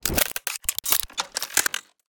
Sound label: granular mechanical sequence glitchy futuristic; Sonniss GDC